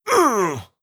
damage grunt male

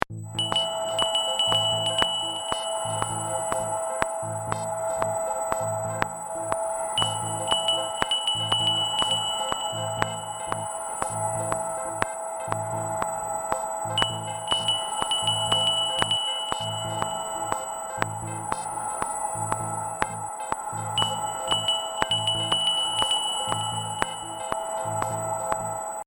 winning screen

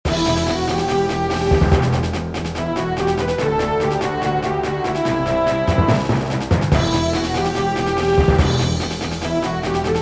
battle Theme

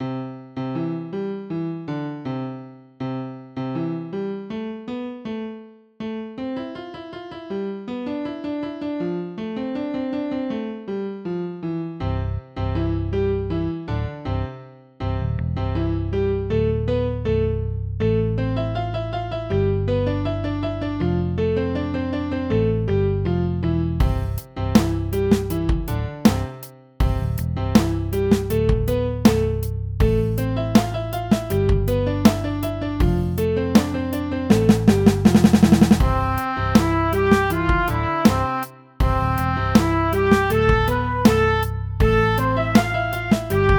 happy melody

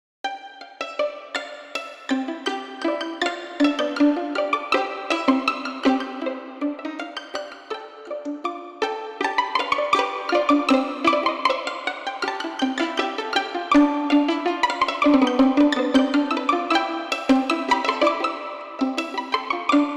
baby song

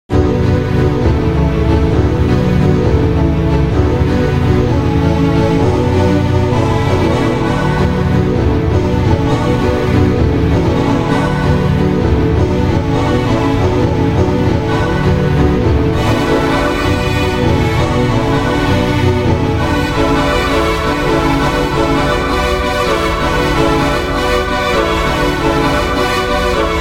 boss music

